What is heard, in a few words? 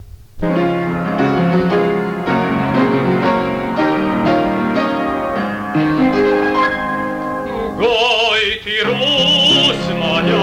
gtr